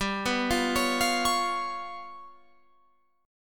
G 7th Flat 5th, Guitar, Open F; G7b5 arpeggiated

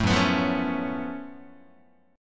Gb Minor Major 11th Gb Guitar; strummed; JGuitar

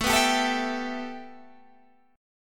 A 7th Suspended 2nd A, Guitar Standard; A7sus2 strummed

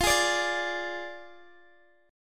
F Minor 6th F down Guitar Open D

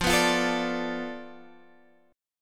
B Minor 7th Flat 5th F, guitar chord

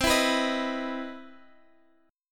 C Major 7th Sharp 9th C, chord

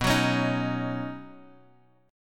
B Major Add 9th, Guitar Drop D chord

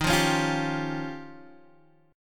F 7th Suspended 2nd Sharp 5th

